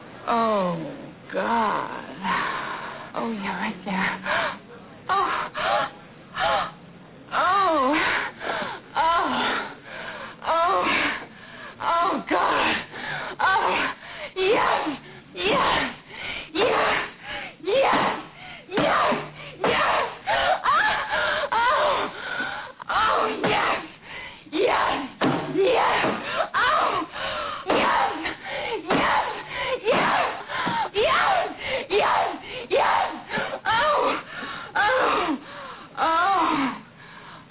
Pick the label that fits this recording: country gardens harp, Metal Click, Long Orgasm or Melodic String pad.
Long Orgasm